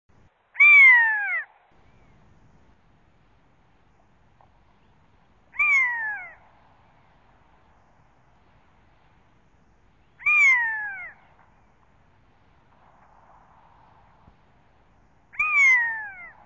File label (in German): bussard